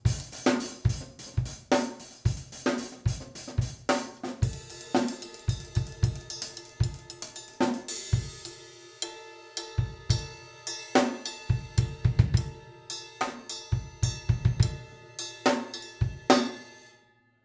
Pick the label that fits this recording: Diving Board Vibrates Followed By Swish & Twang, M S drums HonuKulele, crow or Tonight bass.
M S drums HonuKulele